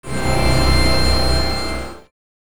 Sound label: tonal fragments to noise